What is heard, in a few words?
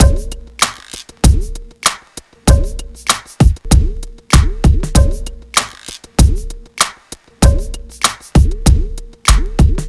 beat; USB Soundscan vol.47; AKAI